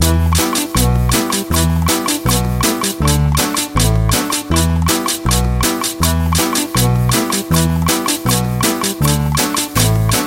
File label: South American